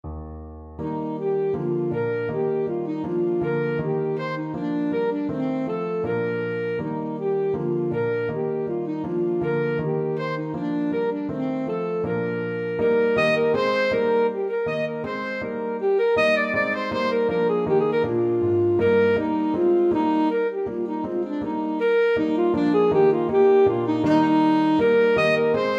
saxophone; handel; harmonius blacksmith

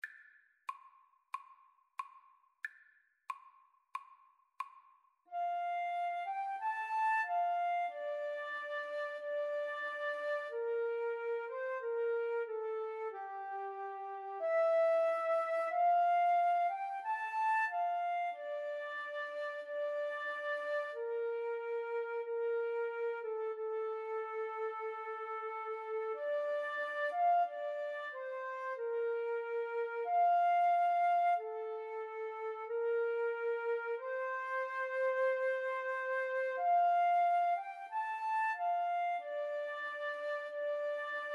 Flute duet

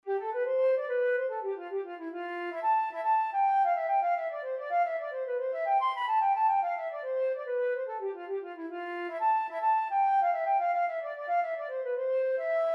hornpipe flute

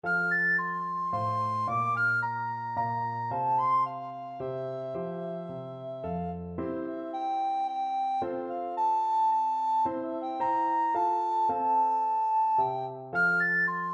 minuet, recorder

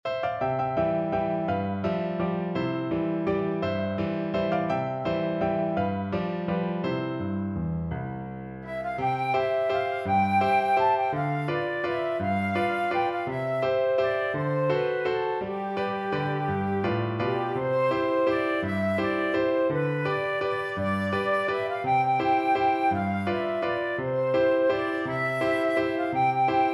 biddy mulligan flute

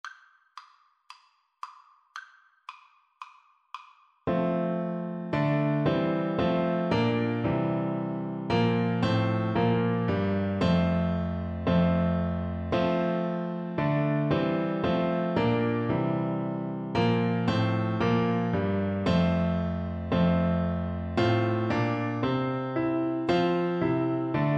good king, Tuba

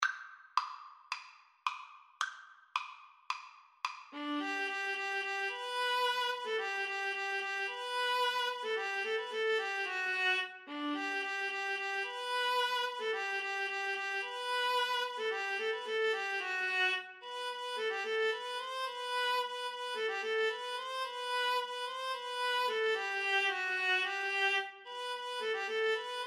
viola duet

